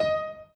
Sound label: Piano Chord